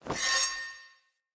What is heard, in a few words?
Weapon Fragment Pickup